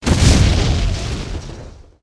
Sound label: Direct Hit Explosion